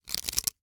Hwatu, card game, hard plastic, small, clatter, stack, drop, tight; Eiravaein Works; Vaeyan IV